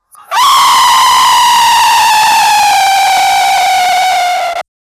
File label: from silence to screamer; ambient noise